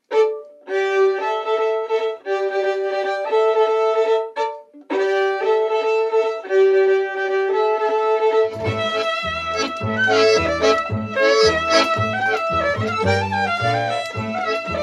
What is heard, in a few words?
Greek Cocek